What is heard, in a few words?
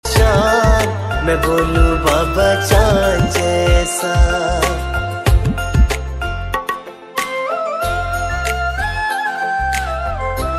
Khatu Shyam Soulful Ringtone